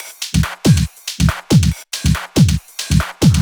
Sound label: Loops 140 BPM Hihat Percussion Loops